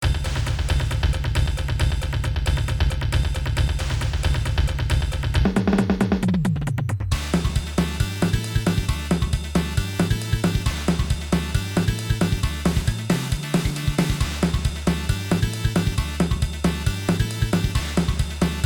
Broken beats

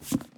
Panel Records Book Place; Among Us

